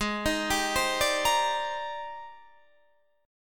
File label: G sharp Major Sharp 11th, Guitar DADGAD; arpeggiated; 18,18,17,17,17,20; G#M#11